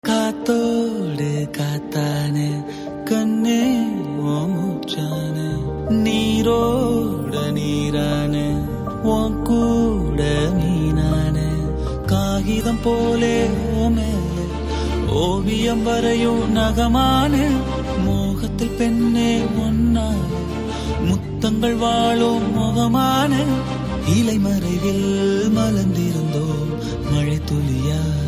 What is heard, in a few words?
Ringtone Male Version